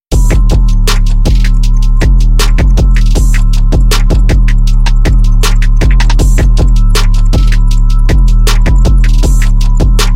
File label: Instrumental Rap Hip Hop Beats Freestyle Trap